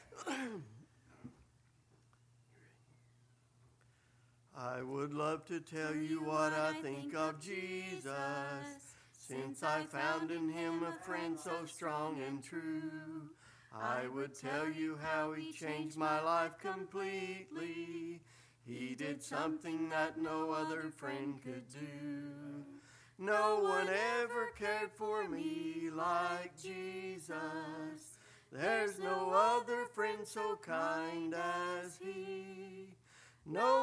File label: singing Family